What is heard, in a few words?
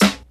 tight acoustic snare sound g key, Royality free snare drum tuned to the G note.; snare drum samples